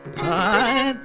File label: carnatic